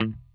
6STR BASS; Sampleheads; Whole Lotta Country 1 & 2

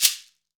SHEKERE, Latin Shakers

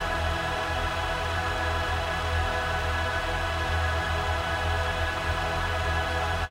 pad; M8

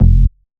Bass F, Bass Synth

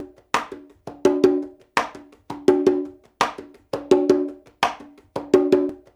LOOP, CONGAS, Percussion; InVision Interactive; Percussion Slam

